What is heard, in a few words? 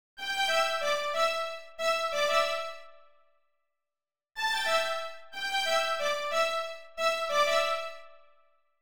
strings, analog funk